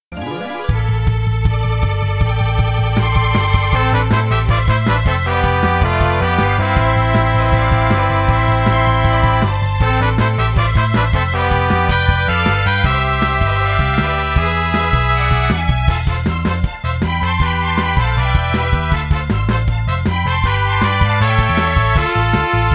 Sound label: battle